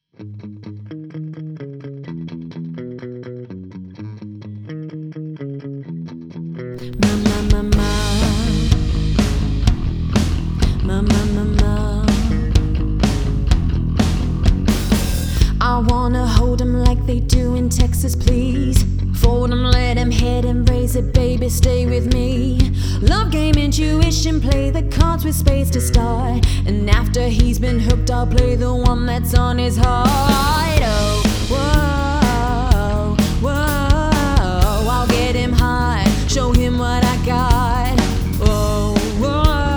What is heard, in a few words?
Live Rock & Pop Band